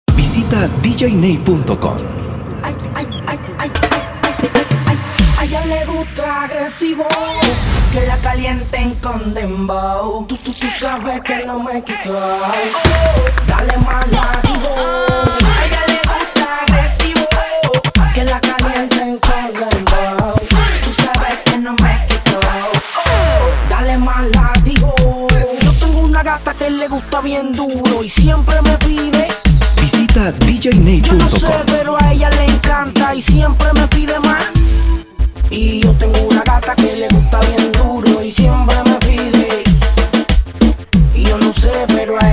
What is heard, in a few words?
Open Acapella